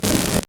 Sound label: static